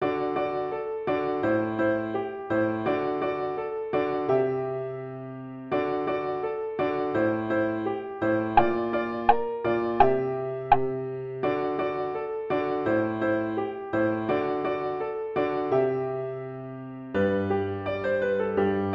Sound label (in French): alto